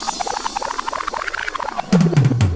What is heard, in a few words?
Rewind Fill